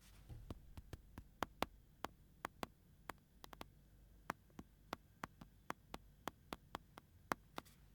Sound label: Touching Mobile Screen